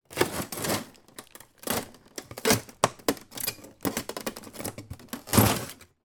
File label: household freezer compartment drawer pull out stuck ice; sound effects one